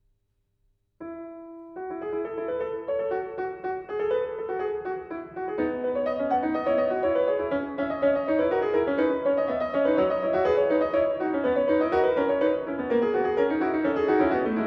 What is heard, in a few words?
Preludes and Fugues for Piano, Prelude & Fugue No.11 in B major; Op. 87